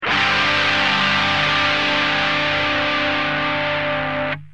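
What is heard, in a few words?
guitar Vesta stereo phase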